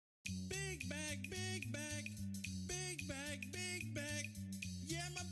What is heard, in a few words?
big back song